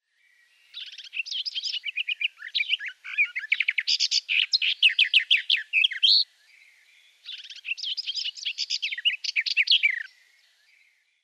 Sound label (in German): Gartengrasmuecke, Gesang; Vogelstimmen; birds